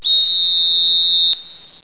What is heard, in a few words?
whistle, hockey